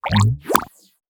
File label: Grab Spitter Fire; gamerip